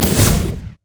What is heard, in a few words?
light strike; LoL; lux